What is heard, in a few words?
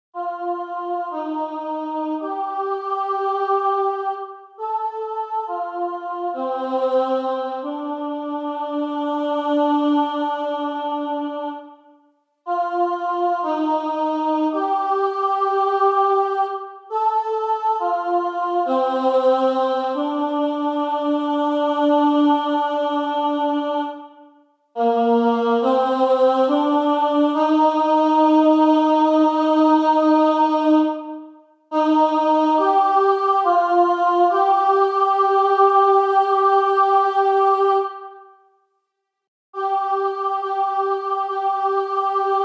Alto